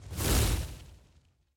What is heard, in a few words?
Fire dash; Super Mombo Quest